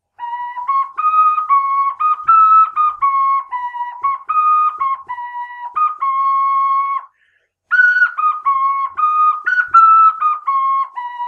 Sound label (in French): plastique 12 trous soprano, ocarina